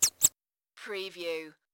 Bats - Single bat squeak

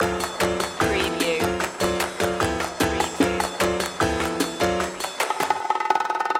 mambo jambo loop

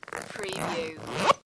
Zipper Zip Up

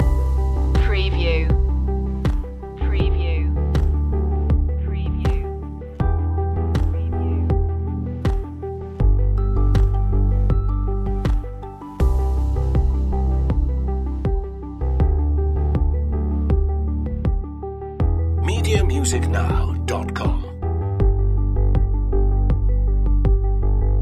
pavement loop

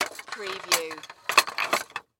Croquet Mallet Cart

